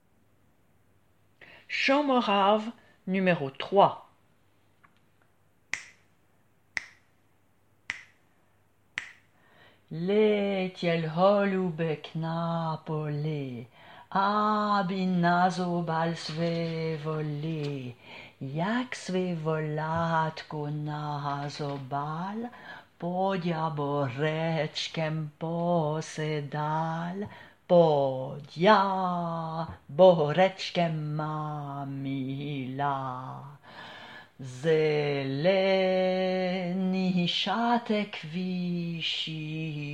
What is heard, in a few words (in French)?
chant morave